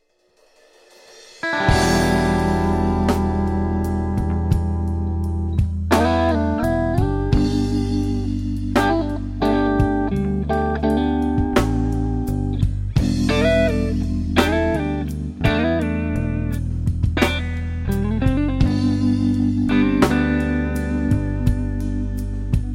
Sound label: Sample Stratocaster